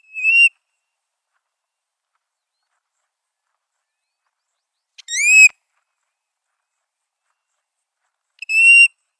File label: American Golden-Plover female alarm call